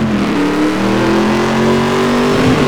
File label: second, vehicles detomaso pantera; lwcars